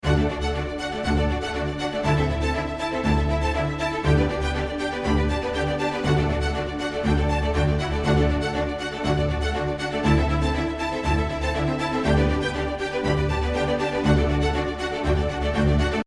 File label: 4 Strings